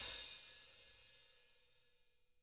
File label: HTRA OPEN, Drums, TAMA; ILIO; Double Platinum Drums 1; TAMA+TAMA